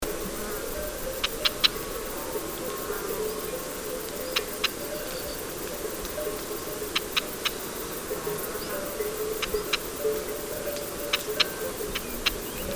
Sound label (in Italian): Averla piccola Femmina